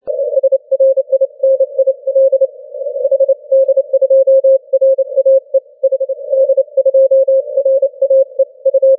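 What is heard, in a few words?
80m continuous wave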